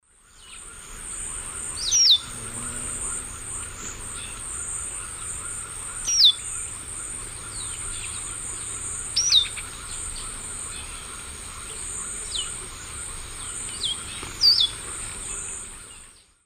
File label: Sporophila castaneiventris, calls; S. America; Eastern Lowlands